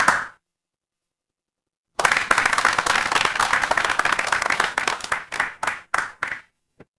crowd applause

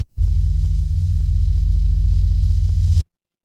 fire electric hum

